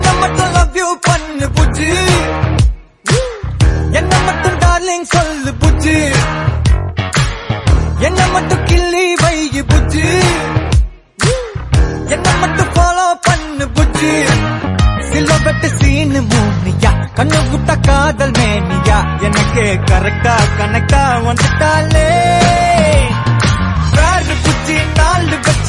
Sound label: Tamil Song Ringtone